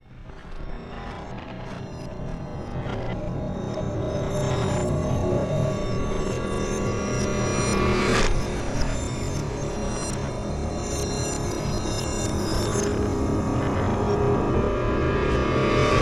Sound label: Reverse Production Element Sigh-Piano-Guitar-Bells; MatiasMacSD